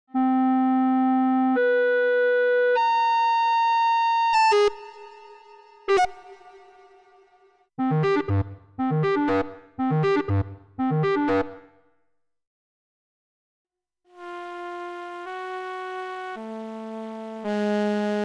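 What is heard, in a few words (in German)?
Aodyo Anima Phy Physical Modelling Synth diverse Bläser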